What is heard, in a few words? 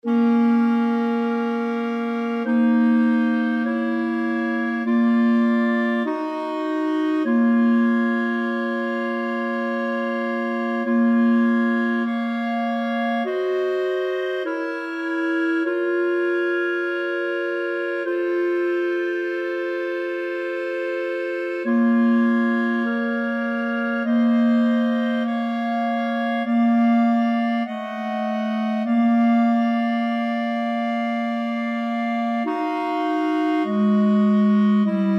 clarinet trio; bruckner